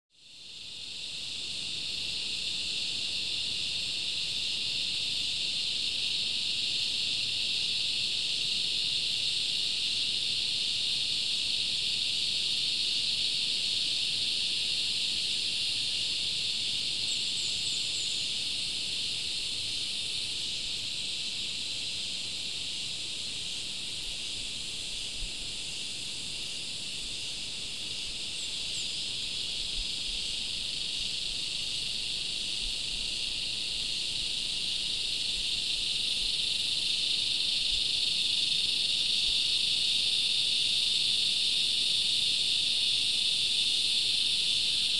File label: Cicadas